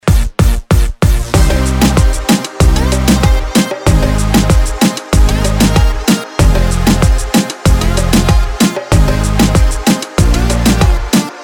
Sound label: Latin Contemporary mix